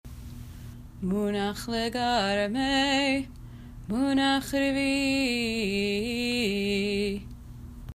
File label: munach l garmeh munach revi i; 9. Munah-Legarmeh Munah Revi’i; Cantillation for Haftarah; The Jewish Theological Seminary